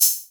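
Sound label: hi hat; E-Lab; X Static Goldmine 1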